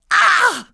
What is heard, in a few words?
HURT; TrueCrimeStreetsOfLA; XBOX